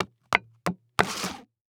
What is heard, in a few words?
Foley Footstep High Heels Patio Stone Walk And Skid; Foley Footsteps